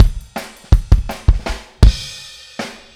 Fill Kick, Drums, 162 BPM; Drums from the Big Room The Mixes; Take Your Toms Off

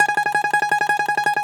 DOMRA, Russian Strings

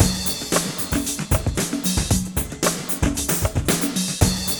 BBP 124, B.BEAT

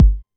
909 Kick, Drum Machines; Novation DrumstationV1

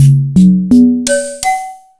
Percussion

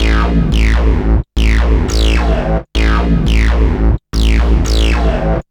Warp Bass, Drum & Bass; Fluid Dynamics

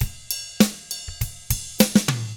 ROK, Drums

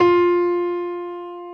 PF STB F 0, GRAND PF

